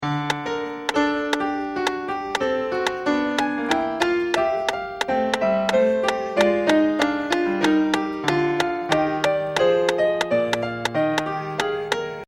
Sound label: piano mono